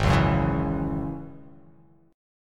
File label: G sharp 7th Suspended 4th Sharp 5th G sharp; G#7sus4#5 strummed; 4,4,4,6,4,x,x,x; JGuitar